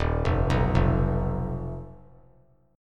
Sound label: D sharp Suspended 2nd Sharp 5th D sharp; arpeggiated; JGuitar